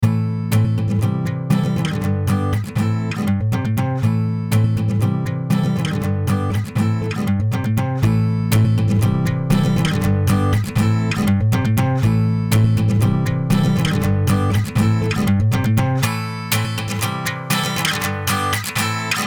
Dist Tube Culture Guitar; ARTURIA

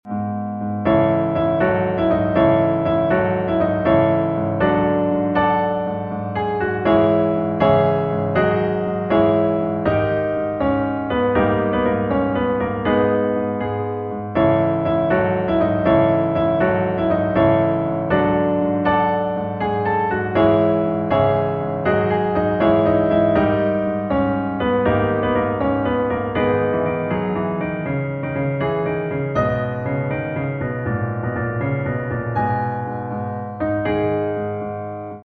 piano Edirol HyperCanvas

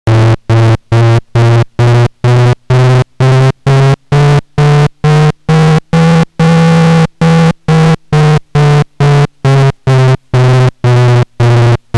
KASTLE Keys

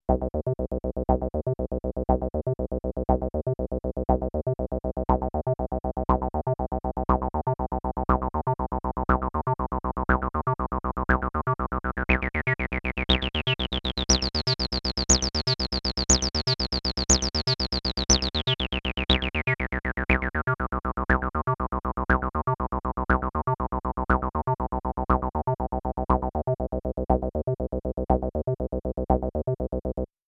TD-3 Square

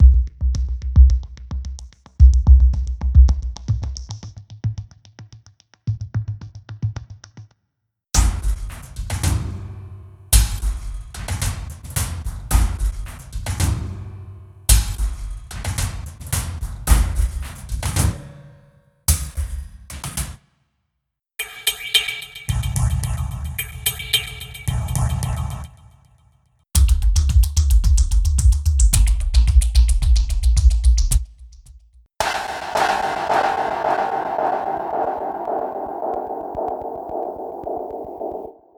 Presets Loops Action; CinemorphX

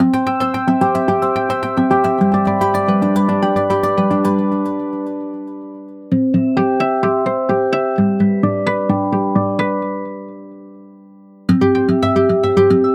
Acoustic Arps

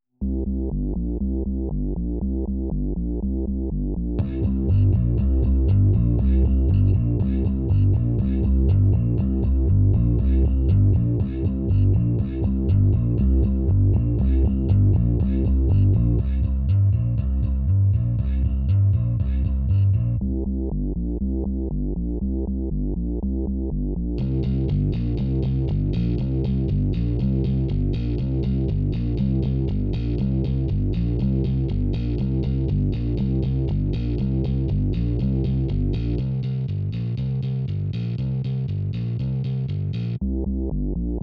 KORG MONOTRIBE; VIRTUAL BASSIST 2

